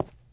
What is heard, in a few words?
step